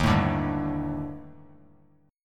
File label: Eb Major 7th Flat 5th, Bass Standard; strummed; chord